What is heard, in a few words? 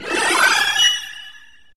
DSP; OMI - Universe of Sounds